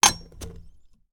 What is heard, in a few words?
Put down the cocktail shaker